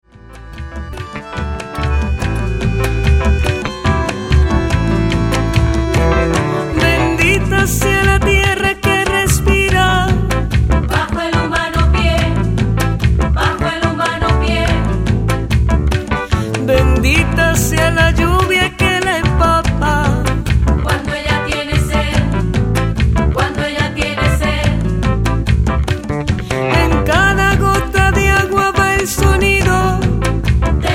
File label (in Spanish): Golpes de Voz